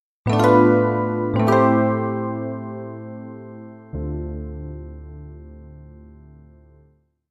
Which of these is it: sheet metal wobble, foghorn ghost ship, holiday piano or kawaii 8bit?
holiday piano